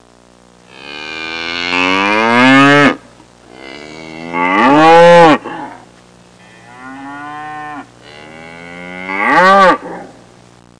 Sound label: Bull mooing with cows in the background